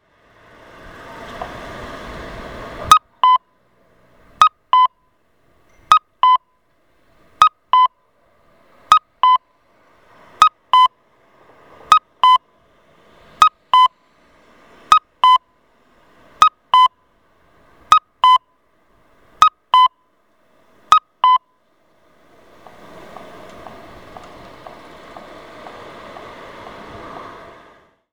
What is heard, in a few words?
kakko, traffic signal; onkyo kosaten; aichi; toyokawa; minamiodori 5chome kita; nagoya U-TK-1